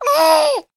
human baby 3 months happy vocal; sound effects one

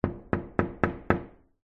household window person knocking on window

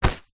foley bag nylon pe grab hand; zapsplat

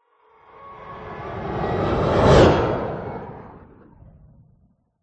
science fiction space vehicle whoosh past

